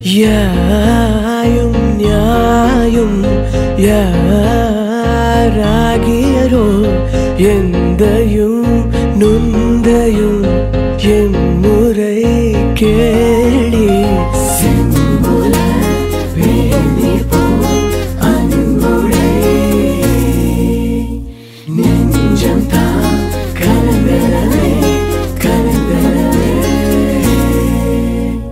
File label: male; tamil; sagaa